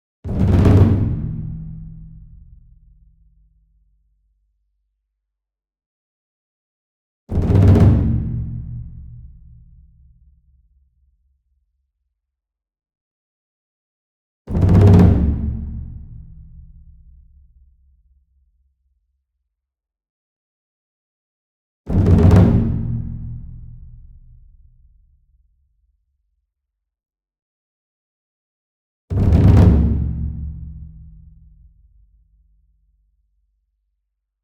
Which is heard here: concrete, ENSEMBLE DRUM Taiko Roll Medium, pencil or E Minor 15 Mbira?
ENSEMBLE DRUM Taiko Roll Medium